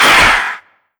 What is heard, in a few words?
pain, antlion; creatures; vox